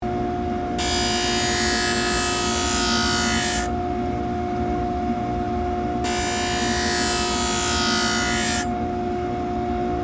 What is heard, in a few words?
Ice Skate Sharpen, Blade; Sports; Sharpener; Ice Skating (Sound Effects:Sports); BlastWave